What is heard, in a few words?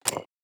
submenu barrier open